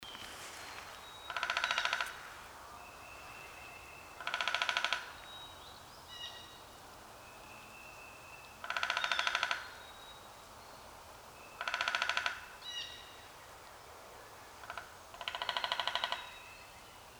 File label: Woodpecker pecking